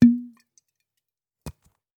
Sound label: cork wine bottle half full